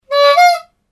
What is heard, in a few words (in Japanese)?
rappa; クイズ